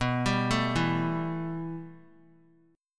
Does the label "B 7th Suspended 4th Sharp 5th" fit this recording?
Yes